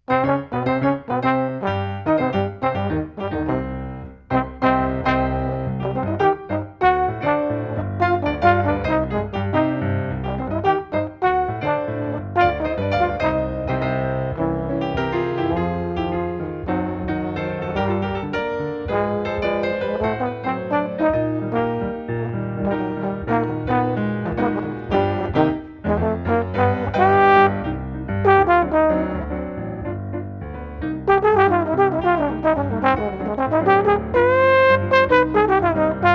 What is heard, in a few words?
Rhumba